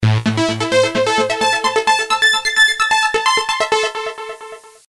present notification

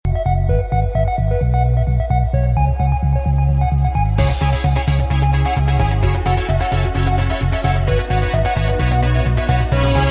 disco style

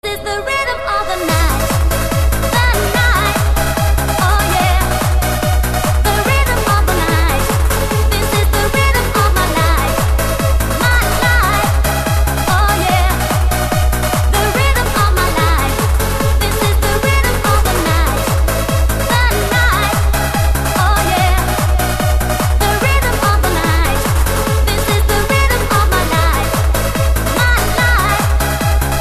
rythem, hip hop ringtone

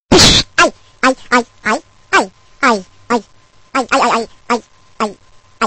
hurt

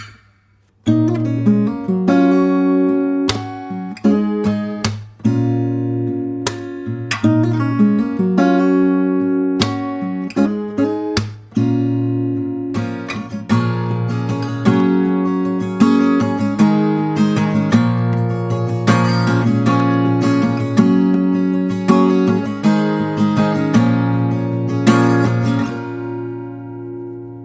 Beautiful guitar

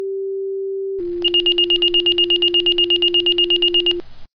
PAGER

